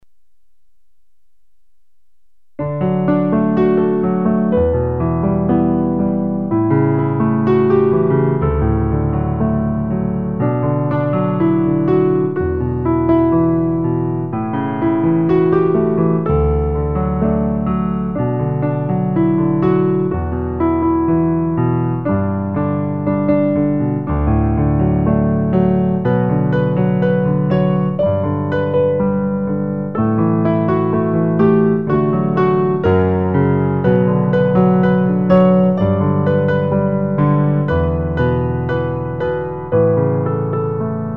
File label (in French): piano